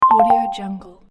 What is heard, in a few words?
twinkly percussive ingame pause sound